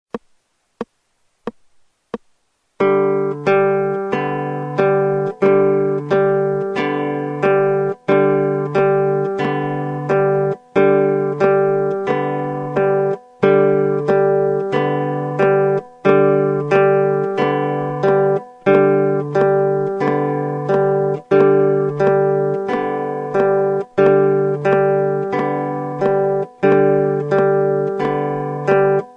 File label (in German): Uebung, gitarre